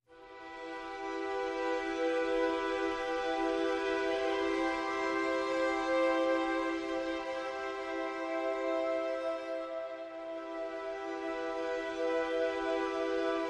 No Vocal

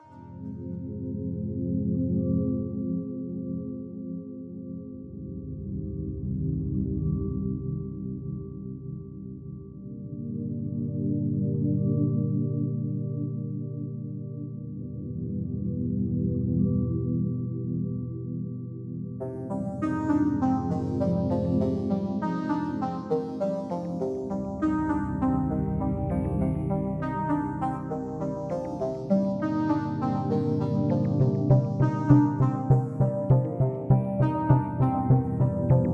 Dissonant, No Strings No Brass

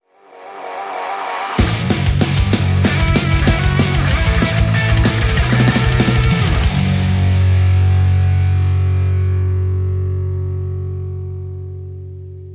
Metal Speedway

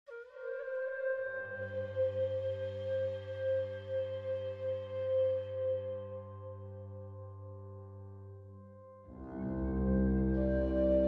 Irish Standard

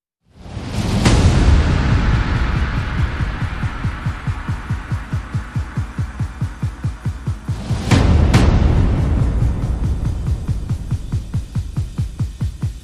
Percussion and FX

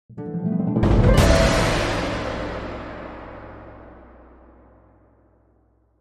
Quick Crescendo Orchestra Sting

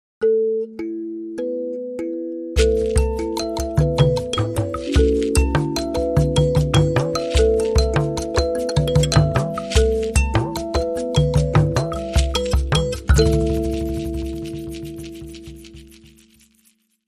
Mbira Speaks

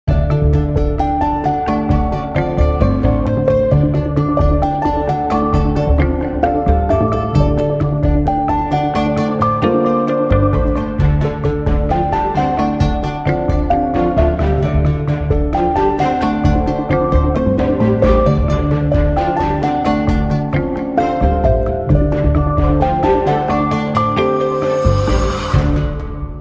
No Perc

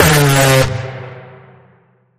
Bend The Alarm, C sharp

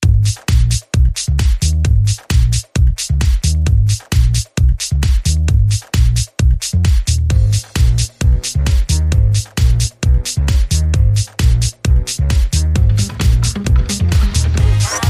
Cool As Funk

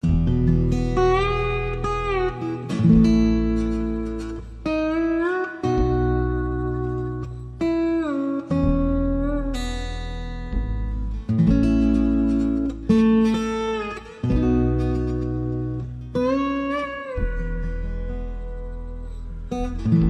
Lap Steel Ballad

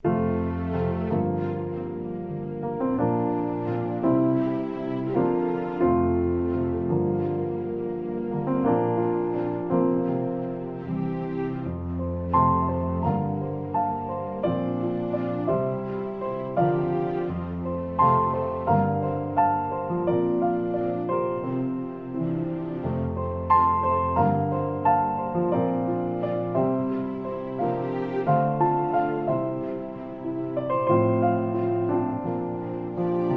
With Strings

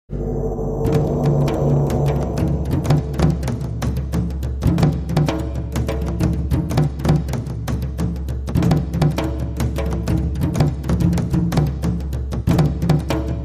Taiko Thunder

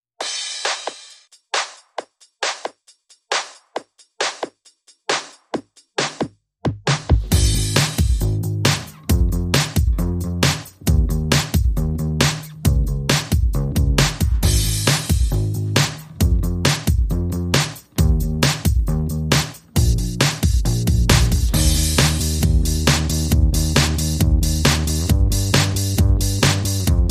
Drums And Bass Mix

